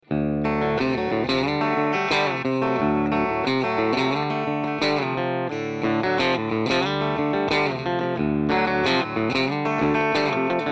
tele vibrolux; tele e50s; vibrolux opend

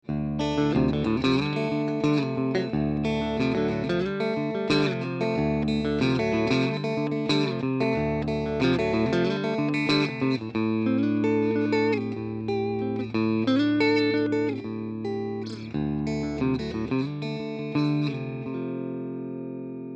tele e50s vibrolux open d middle

